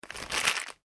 Plastic Packaging Movement; Plastic Packet / Packaging Movement; Materials; foley; McKinney Sound

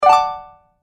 Message Alert, beep

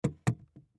Case Set Down, Case Set Down Luggage; Foley; Blastwave

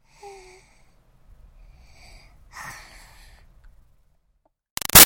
Baby 6 Month Old Breaths Yawn; Babies (Sound Effects:Voice); Big Room Sound

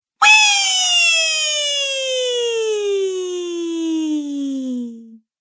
Cartoon Girl Weeee; Cartoon Voice Sound Effects

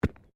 Body movement Organic Impact Light; Body movement foley to simulate falls and deaths; Wrestling And Struggling Sound Effects

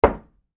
Door Knock Single Hollow Wood Knuckles Impact; Door Knocking; Foley

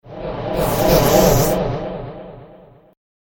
Swarm Of Pestilence, monster sound; fantasy; Andrew Fleming

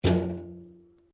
Pipe Tin Move; Metal; Sound Effects:Materials; Foundation3

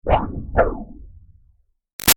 Impact Liquid Digitalness Peel; Sound Design; Stabs; Big Room Sound

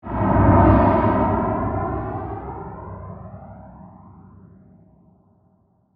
Whoosh Flange, Trail Off, Jet By, Transition; Production Element, Imaging Element, Accent; BlastWave

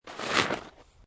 Snow And Ice Slide On Snow; Weather

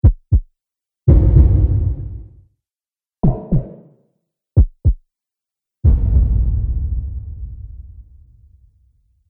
Heartbeat One Shot Collection

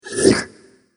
Monster Slurpy Bite; Monster Sound Effects; Andrew Fleming

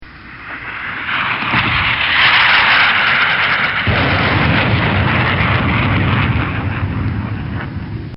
Tomcat Fly By With Sonic Boom; Military; Bobby Cole

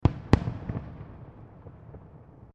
Fireworks Multiple Explosions; Fireworks (Sound Effects:Backgrounds)

